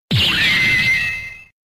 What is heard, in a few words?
impact; at field